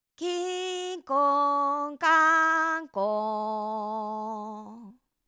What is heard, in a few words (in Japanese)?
きーんこーんかーんこーん